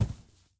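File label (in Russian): частый отдалённый звук хлопков салюта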